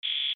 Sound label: error